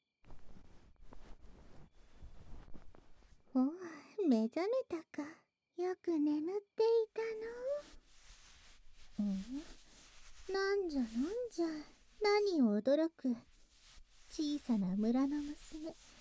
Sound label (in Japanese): 耳舐め手コキ; 双子邪竜に挟まれて逃げられない